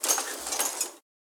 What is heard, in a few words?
Ambience Scatter Machines; Santuario